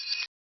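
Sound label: menu select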